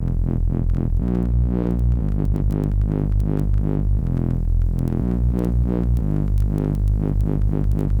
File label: Subbass Wobble